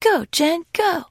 trader jen singing, 七日杀; 商人杰恩（七日杀）

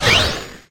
dry fire

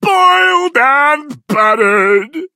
die; clancy

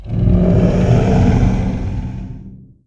Strange Noise; 怪异的噪声; 黑曜石雕像（魔兽争霸3）; 亡灵; Obsidian statue

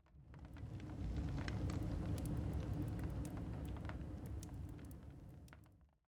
blast furnace fire crackle; Minecraft

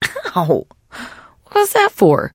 trader pain respond; 商人杰恩（七日杀）

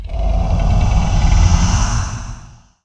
Strange Noise; 一种坚固的雕像，能帮助你的部队补充生命值和法力值。; 准备就绪; 怪异的噪声; 黑曜石雕像（魔兽争霸3）; 亡灵; Obsidian Statue; 初始技能为灵魂之触和荒芜精华，可学习毁灭者形态技能。

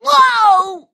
death; Fizz

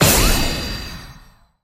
unlock

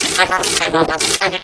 hurt voice; Tick

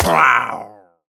pillager death; Minecraft; 掠夺者是一种装备弩的灾厄村民。它会生成于灾厄巡逻队和掠夺者前哨站周围。

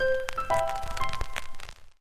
phonograph

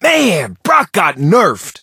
death; 布洛克是一个广场舞男孩，他的语音和手提式音响（Boombox）有关。

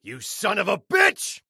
male hate attack

